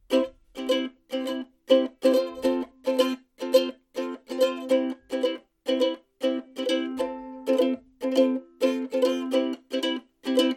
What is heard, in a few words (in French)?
ukulele Rythmique